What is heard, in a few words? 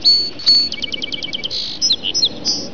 Song Sparrow; bird